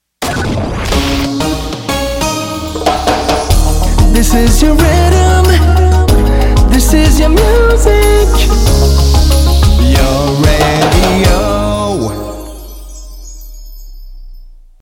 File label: Arabic 93 Bpm Fa diese